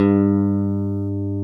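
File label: DAMP GTR G2, mute guitar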